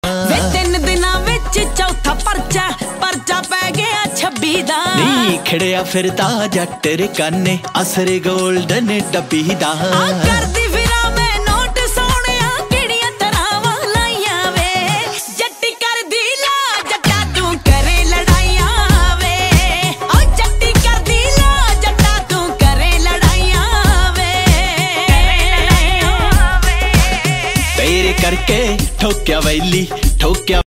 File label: Pendu Punjabi Ringtone